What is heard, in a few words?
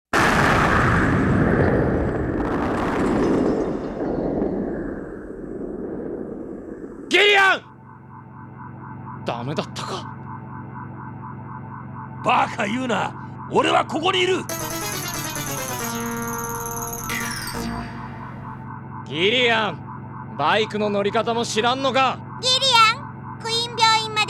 Explosion; Snatcher CD-Romantic; PCE SCD